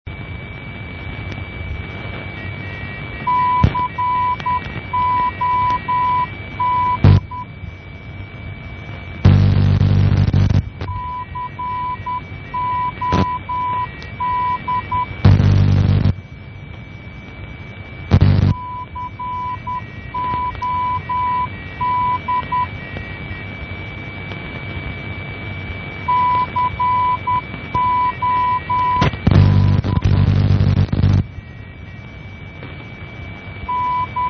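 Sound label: non-directional beacon; COD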